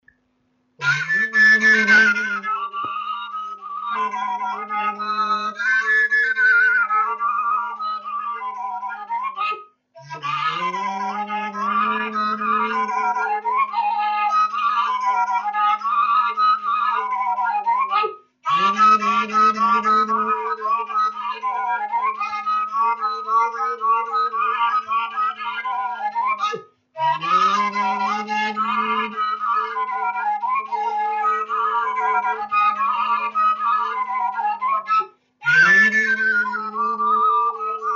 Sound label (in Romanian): Batraneasca fluier; Vaslui